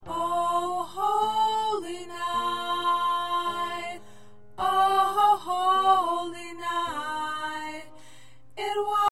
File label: Soprano, choir parts